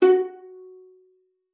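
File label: violin pizzicato F#4; Xsample VOL-5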